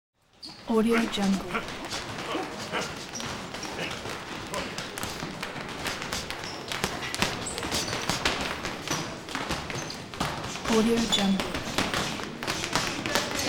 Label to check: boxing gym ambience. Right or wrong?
Right